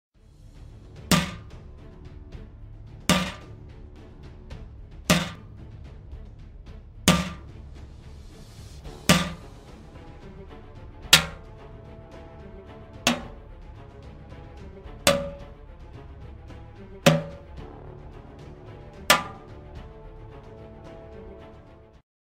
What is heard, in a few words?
Armor Hits